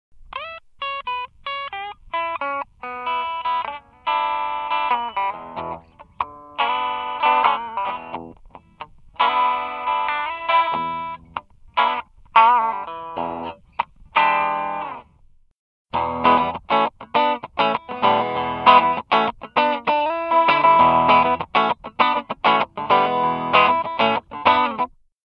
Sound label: tele Hot Rod Clean Neck